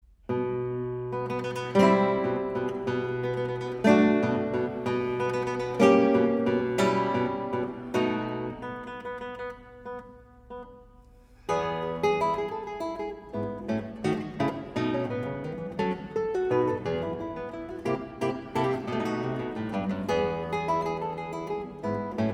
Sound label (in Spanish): guitarra